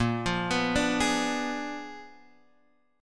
Bb 7th Flat 9th Bb; Bb7b9; arpeggiated; JGuitar

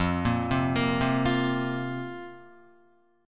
F Suspended 4th, Guitar; arpeggiated; 0,1,0,3,0,1; 4F,4A,5C,5G,5C,6E; JGuitar

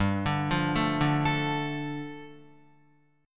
G 7th Suspended 2nd, Guitar; arpeggiated; JGuitar

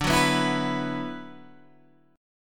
D 6th Add 9th D, Guitar, Open G

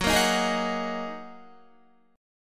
Gb 6th Add 9th Gb down Guitar

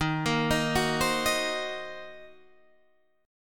Gb 6th Flat 5th Eb

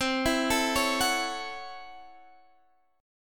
A 6th C, Chord; A6/C arpeggiated

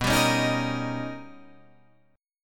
B Augmented Major 9th B Guitar Open D

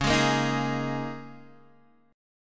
D sharp 7th Suspended 4th

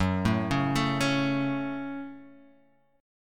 Gb Minor, Guitar, Half Step Down

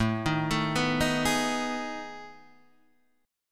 B 13th A, chord

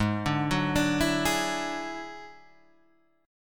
F sharp 7th Suspended 2nd Sharp 5th, G sharp

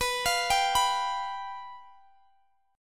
F Suspended 2nd Flat 5th, B

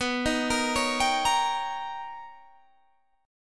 B Augmented Major 9th B, chord

